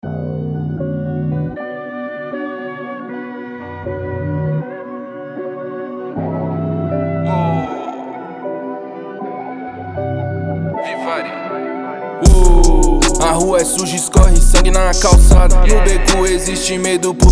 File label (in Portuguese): freestyle rap trap